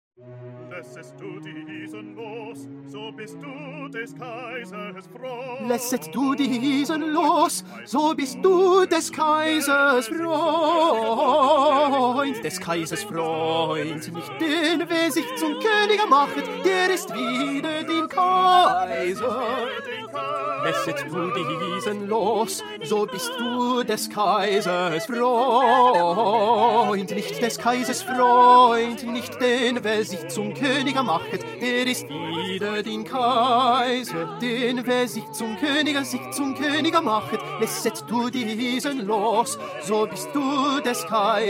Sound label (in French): petit choeur tenors